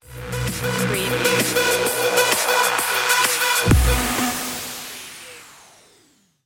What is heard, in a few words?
echolot stinger